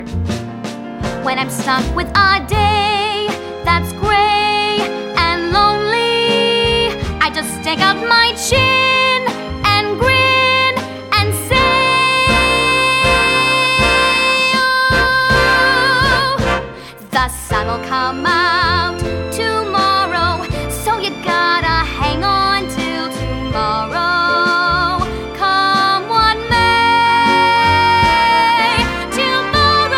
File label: Vocals